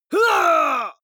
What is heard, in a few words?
male fight pain shout; vocals; comedy-cartoon; soundpool